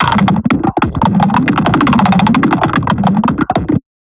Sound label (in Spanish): roce metales